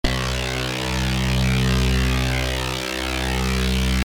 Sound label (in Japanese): プロペラ機羽音